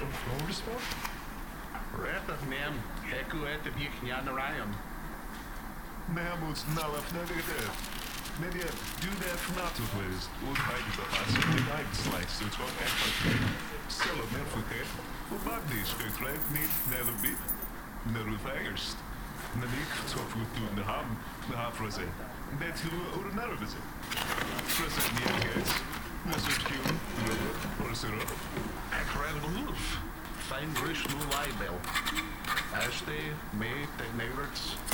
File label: medieval office with people loop, ambiences; Michael Ghelfi